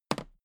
toolbox handle bounce regular

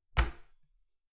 cupboard close dishes ringing soft

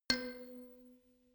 notification kalimba rattle low

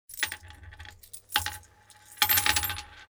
money noise

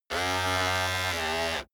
servo steady

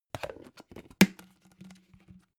plastic bowl close hard

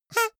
hoot soft short

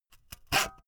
saw use short unsteady

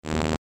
future buzzing elements layer

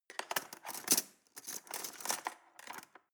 cutlery teaspoon drawer scrape long

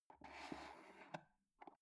foot switch dimmer slide up slow

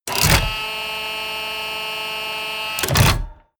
tank small turret rotate

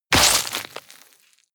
tear bone and flesh short

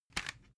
cd cover open

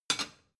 plate small shelve

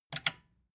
metal button click power off fast

